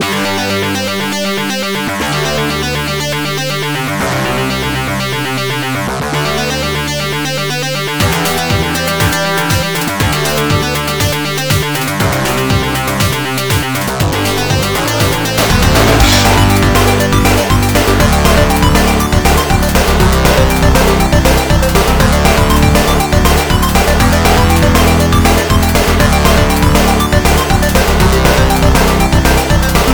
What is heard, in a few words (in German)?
music, Eine stimmige Musik mit hohen Tönen.; Duck Game; arbuckle